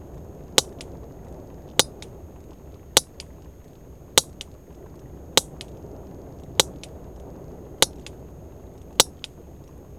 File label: clicks; marine mammal behavior lab